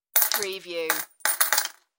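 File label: Multiple Coins Bowl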